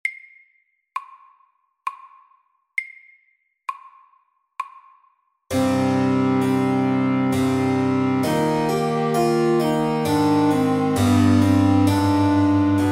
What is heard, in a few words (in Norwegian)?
Menuett SLOW